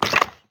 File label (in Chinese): 凋灵骷髅脚步声